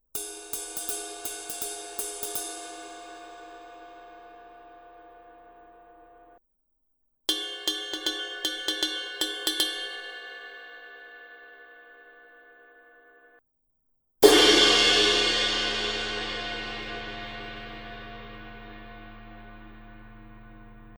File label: 22 inch dark energy ride ride bell crash, cymbal; mark II; Paiste; signature